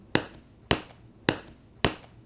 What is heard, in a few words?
footsteps